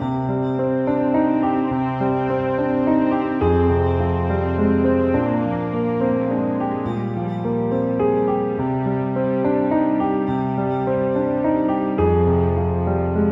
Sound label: Looping piano